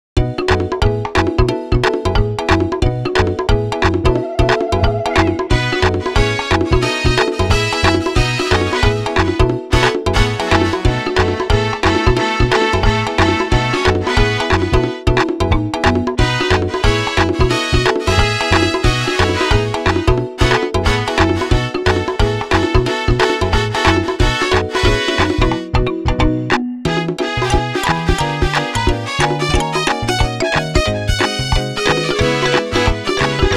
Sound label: Deep Jungle; Deep Jungle (Purified); Tomba! Special Edition Soundtrack